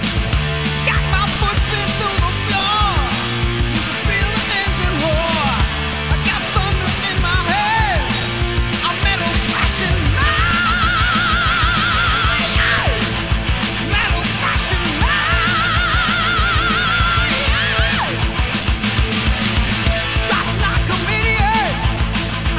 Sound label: metal, music genre